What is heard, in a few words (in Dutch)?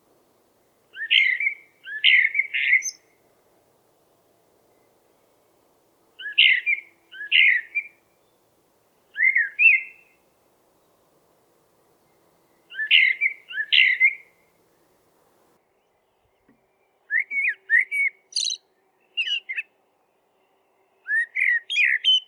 merel zang